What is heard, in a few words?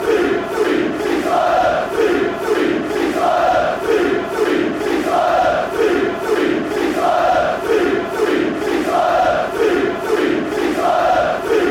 sea sea seasiders, chants; brighton